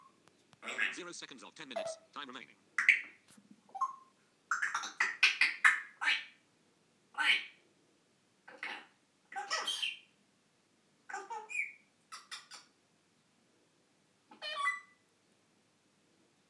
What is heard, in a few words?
my very chatty african grey including an attempt at the birds outside